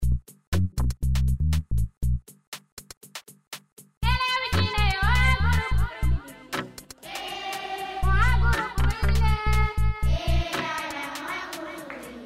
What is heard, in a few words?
African School Song